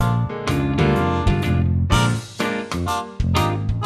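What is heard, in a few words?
music instructional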